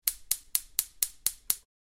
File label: Gas stove turn on gas clicks ignition; cooking